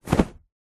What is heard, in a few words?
foley blanket shake single flap